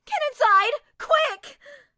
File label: town human far; Blind Drive